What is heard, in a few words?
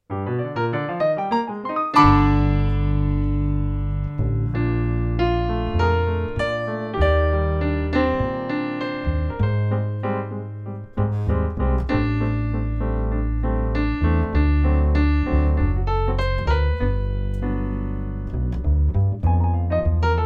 piano bass; Piano Jazz